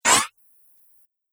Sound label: Whoosh Swipe